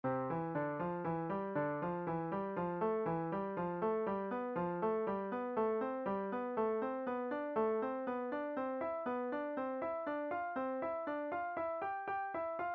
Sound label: Five finger Intervals Thirds, piano